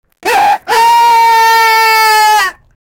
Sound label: the cry of an enraged, animal cry